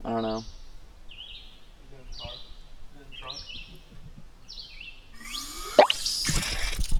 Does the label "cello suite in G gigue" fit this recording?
No